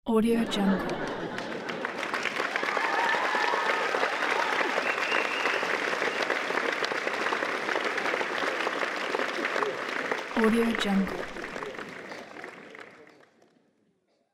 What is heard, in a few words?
laughter and applause